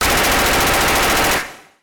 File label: submachinegun